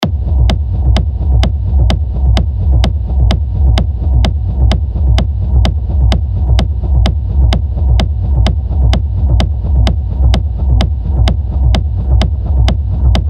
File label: Moog